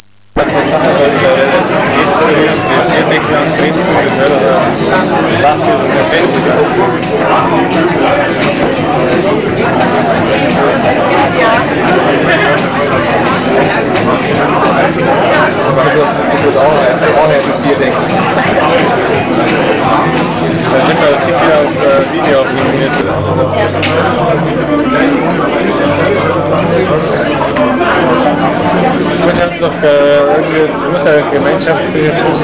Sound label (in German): Kneipe